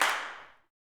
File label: CLAPS, Gospel; SNAP; ILIO; Vocal Planet